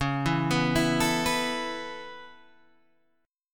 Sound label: C sharp Minor 7th Sharp 5th C sharp